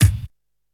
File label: clicky bass drum sample a key